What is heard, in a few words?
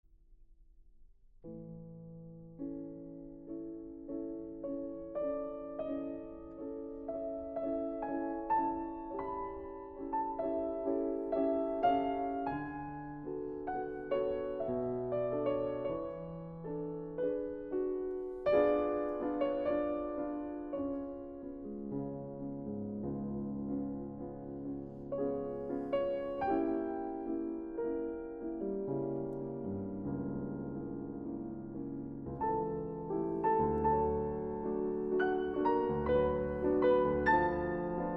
Piano Sonata No. 1 in F sharp minor Aria; Op. 11